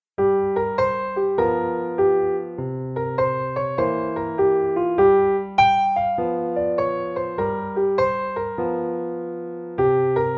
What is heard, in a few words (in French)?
blues, Piano seul; Jazz